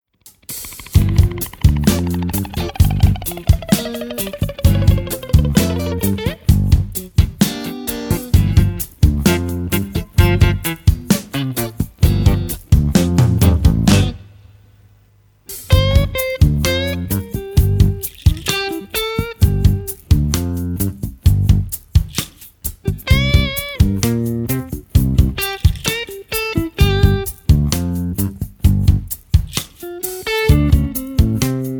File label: Four Guitars